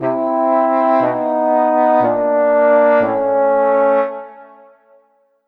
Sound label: slow sad trombone fail